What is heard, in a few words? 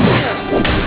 laser gun